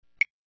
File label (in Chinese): 定位音